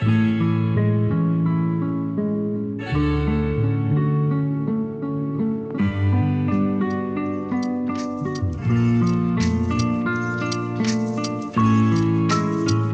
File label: chill